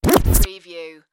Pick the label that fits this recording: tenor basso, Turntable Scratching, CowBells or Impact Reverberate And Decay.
Turntable Scratching